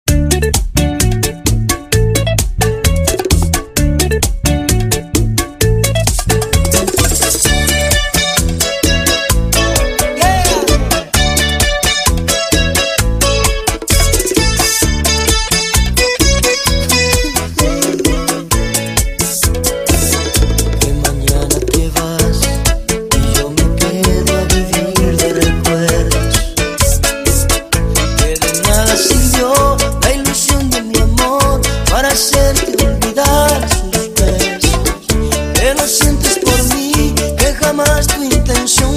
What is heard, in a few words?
BACHATA INTRO BPM 130